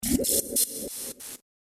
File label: Warped Water Static Bubbles; Water Sound Effects